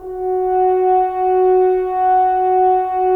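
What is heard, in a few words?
Solo French Horn, Brass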